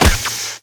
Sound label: Gun Cloth, Damage